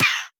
鹦鹉死亡声

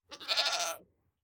山羊待机声

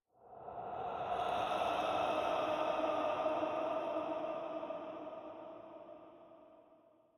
灵魂沙峡谷幽魂声; ambient

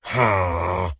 唤魔者待机声

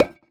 饰纹陶罐插入声

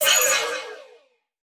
取走物品声; 悦灵

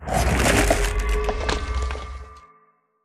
重生锚设置重生点声

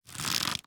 弩快速装填三阶声

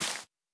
海绵破坏声

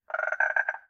青蛙待机声

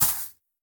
下界苗踩踏声

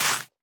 甜浆果丛放置声

